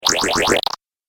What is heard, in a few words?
8 Bit Style Laser Machine; Video Game Sound Effects